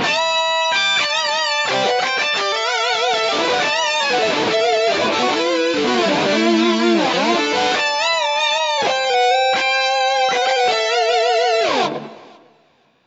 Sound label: Pitch Shifter Mode Perfect 5th Up